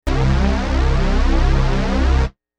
Big Lead

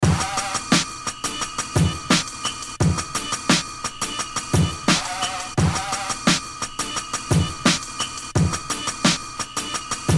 Break

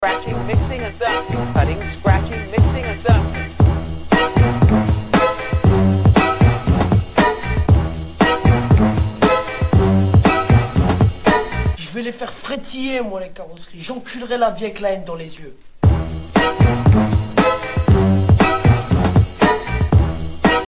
Break

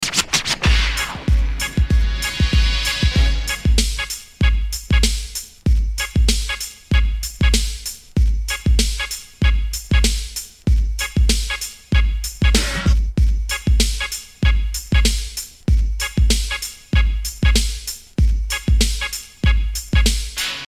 Break

